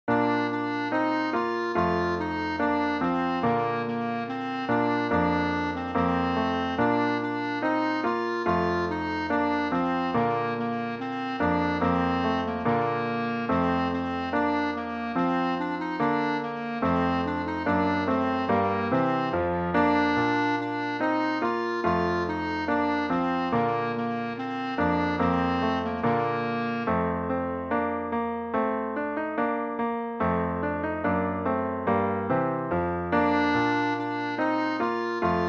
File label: clarinet